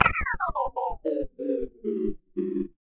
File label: Toy Phone Pickup